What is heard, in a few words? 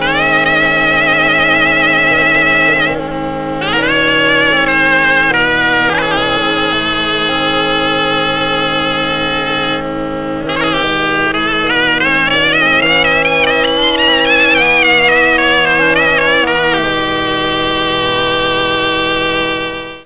zampogna